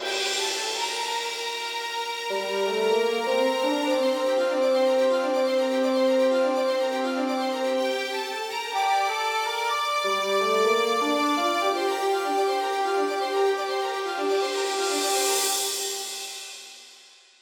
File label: Main Melody; Jungle Chase Rnd; tintin reporter cigars of the pharaoh